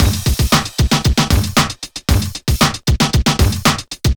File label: chunky funk, drum loops